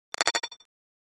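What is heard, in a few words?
Flash Gear Electronic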